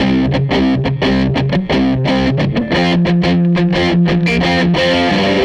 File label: Tele Guitar Loop